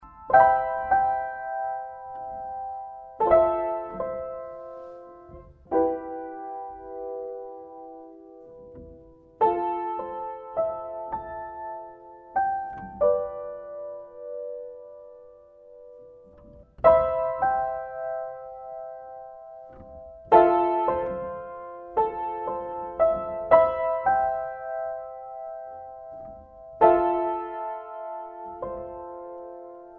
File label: Christmas Piano